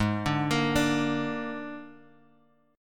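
Ab Suspended 2nd Flat 5th chord, Guitar, Drop D tuning; down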